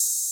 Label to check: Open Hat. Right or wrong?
Right